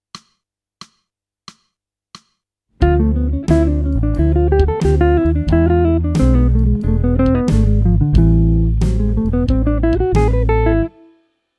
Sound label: jazz